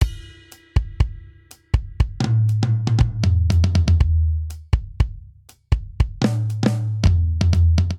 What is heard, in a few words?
Bossa Nova Outro 120 bpm, Drum; 4 4; MIDI Clips; Ableton; Factory Packs; Drum Booth